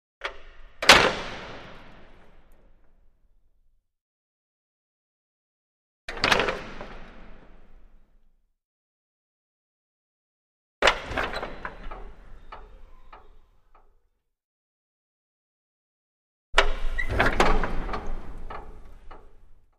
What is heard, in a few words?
Metal Gate; Medium Sized Opens And Closes, Reverberant Interior